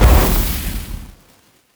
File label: Explosion Fire; KriptoFX; Realistic Effects Pack v1; PARTICLES